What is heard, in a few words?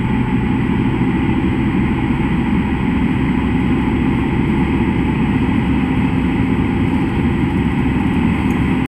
special noise when driving more than 60 mile per hour car noise